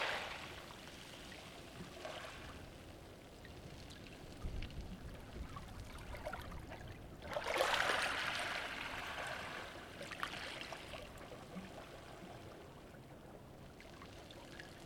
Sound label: Allihies waves with pebbles loop; Anne Bourne